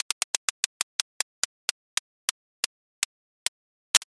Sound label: roulette